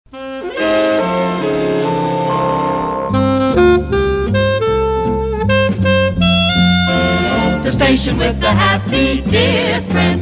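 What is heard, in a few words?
Reeds Signature and Theme Sonovox; WPTR; PAMS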